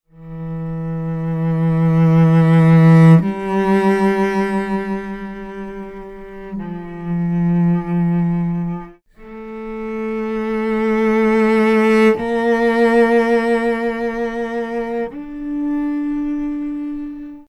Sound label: strings, Sad Loops